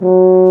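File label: BRASS F TUBA; Roland LA; ORCHESTRA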